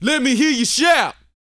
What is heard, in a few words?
VOCAL, R&B Dance, HIP-HOP